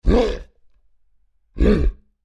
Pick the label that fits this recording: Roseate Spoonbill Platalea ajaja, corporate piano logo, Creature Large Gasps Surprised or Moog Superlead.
Creature Large Gasps Surprised